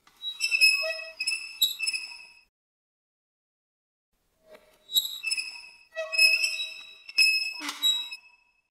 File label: Metal squeak